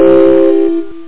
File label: EPiano1 Maj4, Chords; AlgoMusic